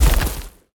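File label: growl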